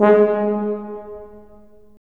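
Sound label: brass tuba; LA Composer vol. 3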